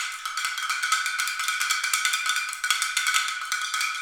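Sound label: rotating drum, perc fx; Roland LCDP14 Africa VOL-2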